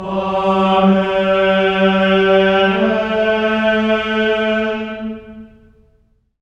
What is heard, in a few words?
amen; Spectrasonics; Symphony of Voices; GR AMENS